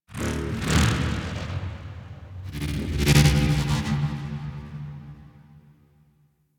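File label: Tech Texture Medium, Ambience; Warframe; Cephalon Weave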